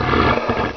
gonome pain; mystic radar; mr zombie1

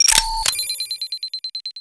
bomb, ambience

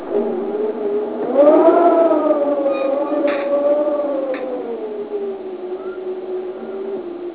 windy

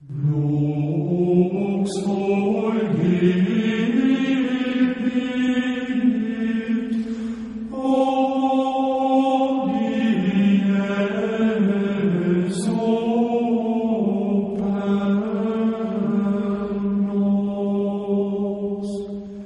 gregorian chant

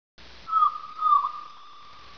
gull

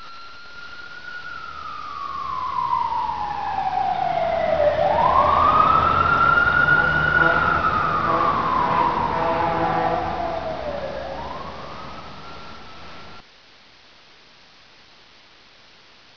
sirens unlooped; de racetown

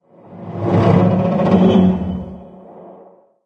metal groan

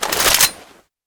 car draw, weapons; deluge

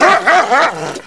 bark

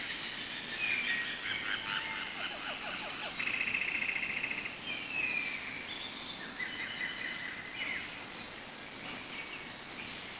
Jungle, ambience

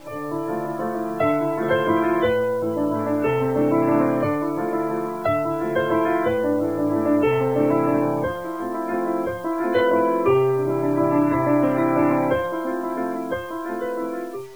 piano

